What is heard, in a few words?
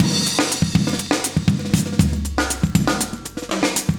120, loops; Sound Effect Library